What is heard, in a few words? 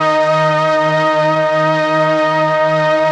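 str d sharp 4, String, MELLOTRON; Best Service ProSamples vol.55; Retro Sampler; Choir + String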